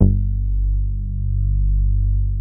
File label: MOOG BASS G2; Best Service Dream Experience